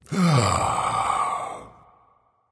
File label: content, voice; Smite; ymir skin6